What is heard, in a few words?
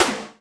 E-Mu Proteus FX Wacky Snare